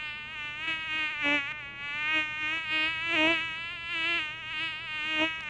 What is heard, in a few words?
mosquito